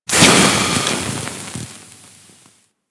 Infested Pistol Gas Burst; Warframe

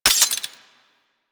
Quill Dart Gun Ice Mech; Warframe

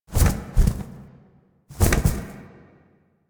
Owl Finisher Stop Fail; Duviri; Animals

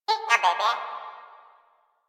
flying robot voice

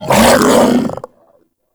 Infested Vocal Attack, Enemies; Warframe; Femur

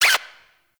Necramech Repair Drone Idle Short; Enemies; Warframe

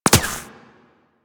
Shield Impact

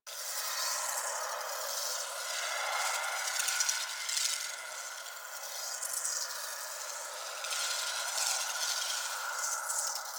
Void Loop; Powers; Warframe; Focus; Operators; Wraith

